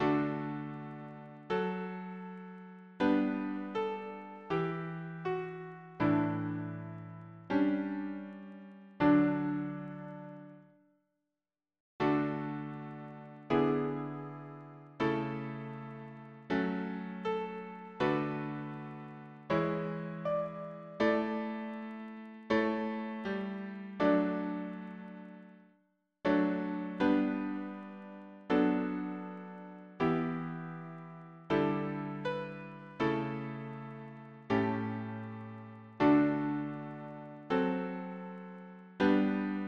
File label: harmony piano